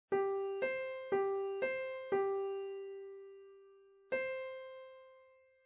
Gehoer Quinten Quarten; Gehoerbildung

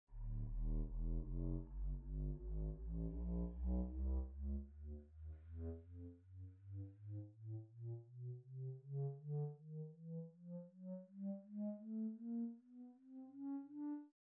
Klarinette; Instrumentenkunde

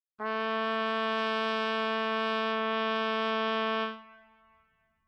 Klang Trompete 220 a; Akustik

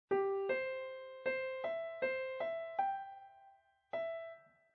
Gehoer Quinten Quarten; Gehoerbildung

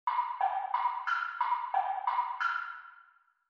Holzblock; Instrumentenkunde

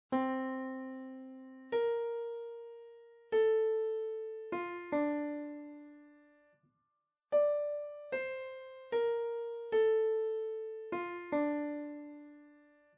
Gehoer Septimen; Gehoerbildung

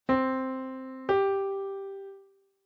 Intervalle Quinte

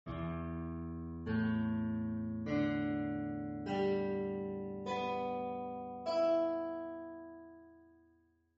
Gitarren Stimmung; Instrumentenkunde

